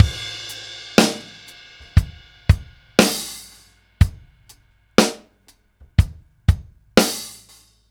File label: Ballad Loop, Drum, 70s Ballad; Drum Tools